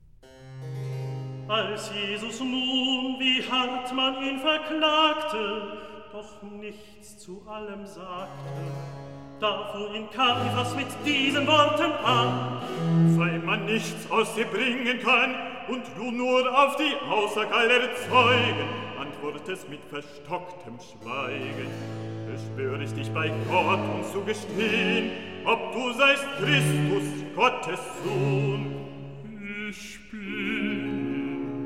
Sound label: Recitativo; evangelist, Caiphas, Jesus Als Jesus nun; Klassika; Handel Masterworks